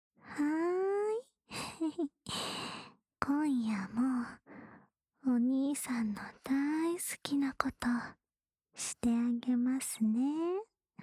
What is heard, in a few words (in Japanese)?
安眠用 お耳 ふーふー（右耳）